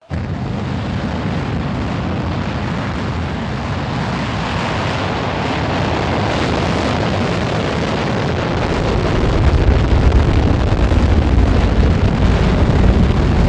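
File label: space rocket, rocket launch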